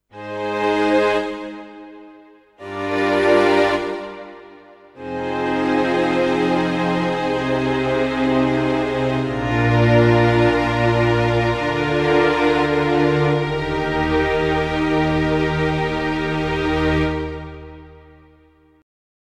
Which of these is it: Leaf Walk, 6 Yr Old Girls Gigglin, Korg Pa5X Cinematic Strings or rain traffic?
Korg Pa5X Cinematic Strings